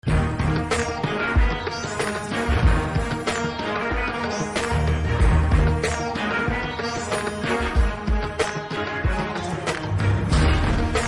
Bgm; Vettai

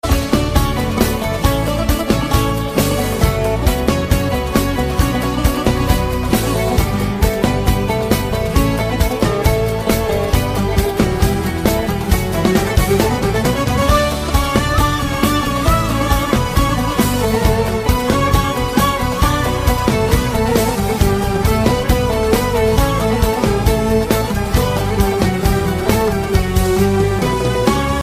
Greek oriental

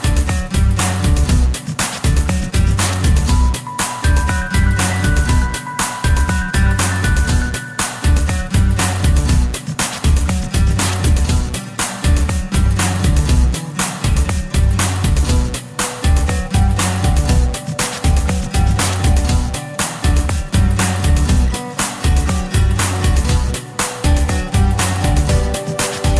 Retro Pop Mix

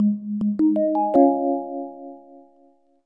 Message Tone

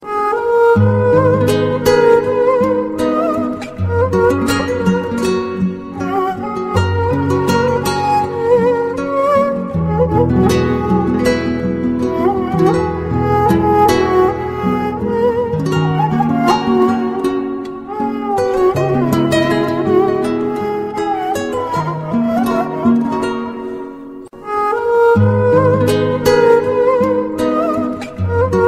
Love String

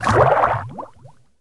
Box Open; BFBB

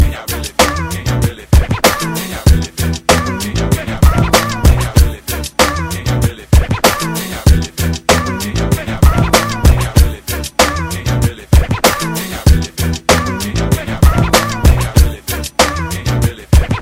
Groovy Mix

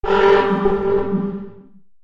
Warning

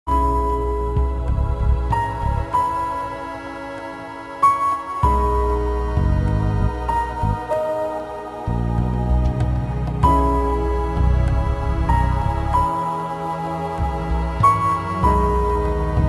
Wake Up Alarm

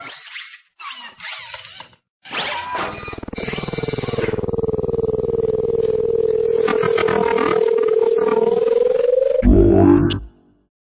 Evil phone

